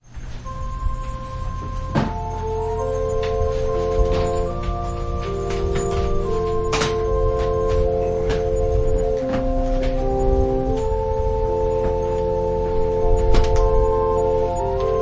Organ prelude; Psalm 46

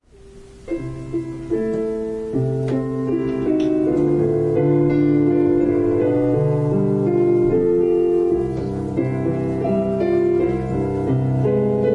Piano prelude

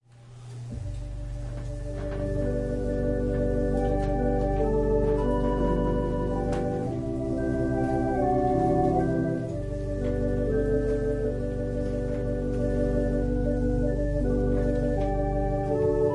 Organ prelude

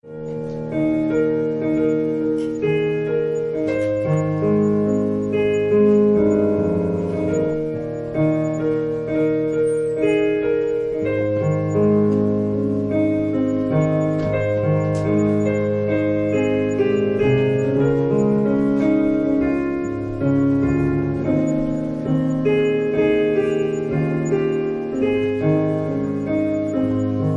Piano prelude

